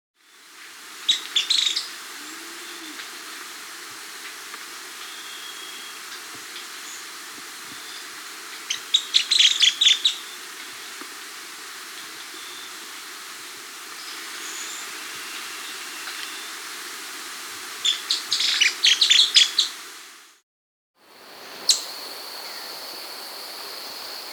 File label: Flavescent Flycatcher (Myiophobus Flavicans), Andean Birds; S. America